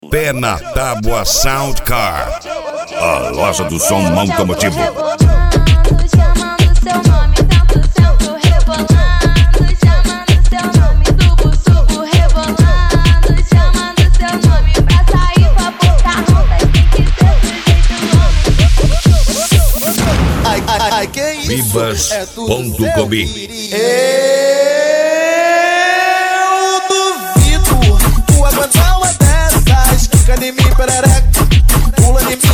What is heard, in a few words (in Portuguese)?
sound car carnaval